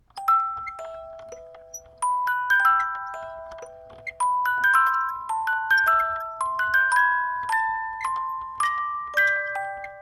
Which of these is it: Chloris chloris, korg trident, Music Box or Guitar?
Music Box